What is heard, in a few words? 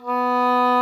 ENGLISH B3, REEDS; Greytsounds VOL-1